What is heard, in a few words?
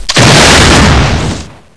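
flintlock sniper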